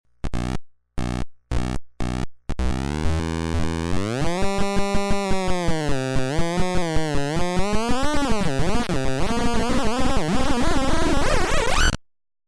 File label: crazy talk; unpredictabox